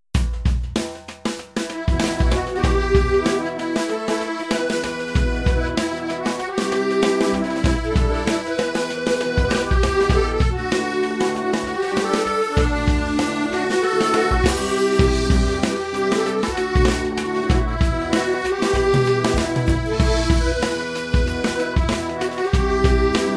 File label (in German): mit Drums; La paire de Saulxures; Internationale